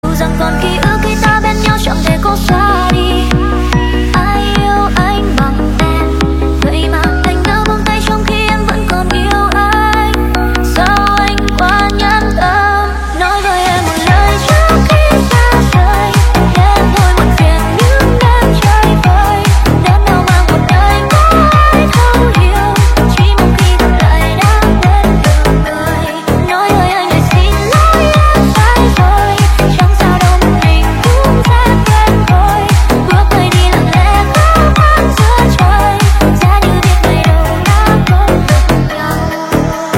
Remix Hot TikTok

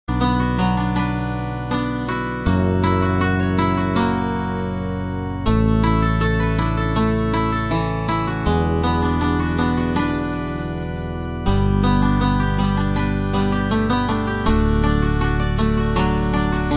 piano

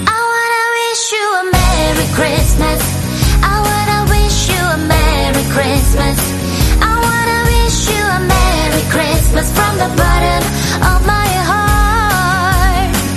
Remix Noel

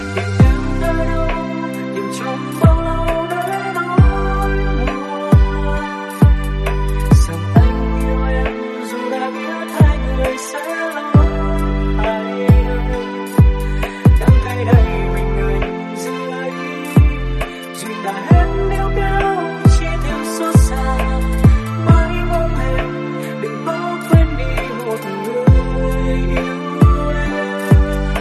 Lofi

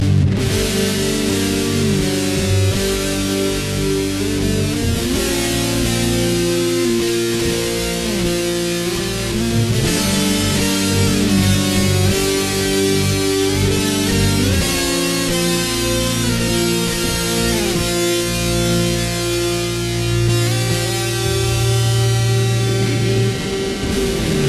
guitar

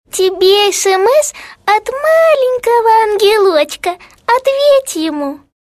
sms

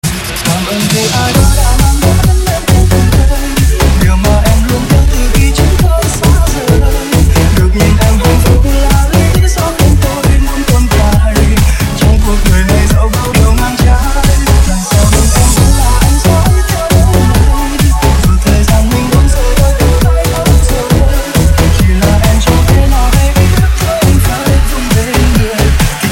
remix

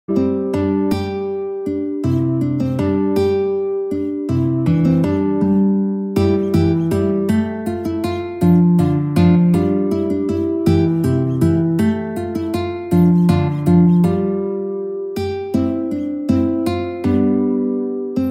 guitar

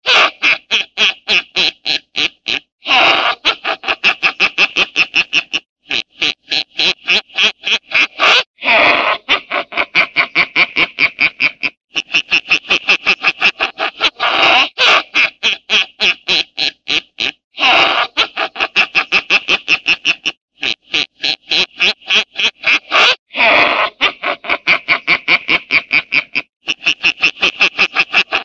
duck laugh

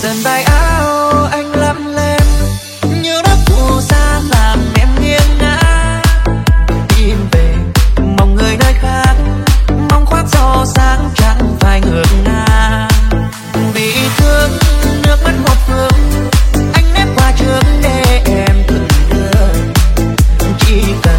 Remix TikTok

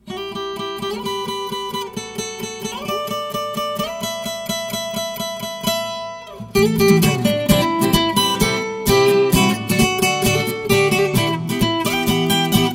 Guitar Remix

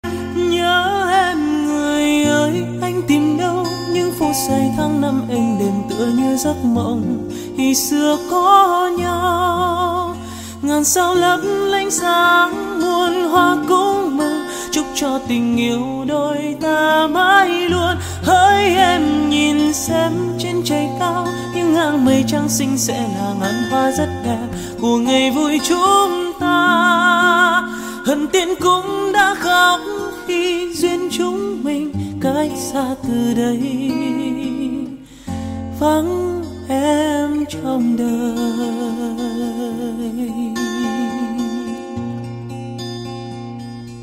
acoustic cover

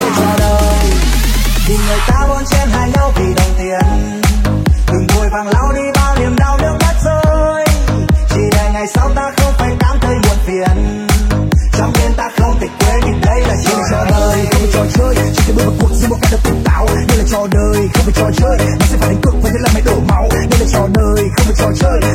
Remix Hot TikTok; Nhac Phim Tro Doi OST

